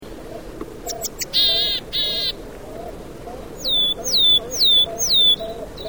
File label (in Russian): burogolovaya gaichka golos